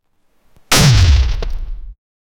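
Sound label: falling down after jumping, video game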